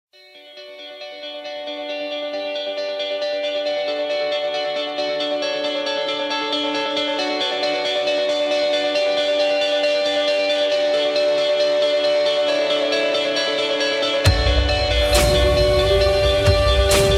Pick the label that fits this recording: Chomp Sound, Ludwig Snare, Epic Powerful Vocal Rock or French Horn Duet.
Epic Powerful Vocal Rock